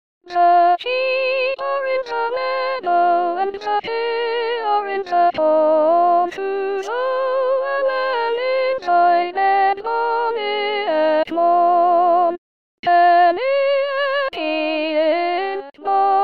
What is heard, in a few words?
soprano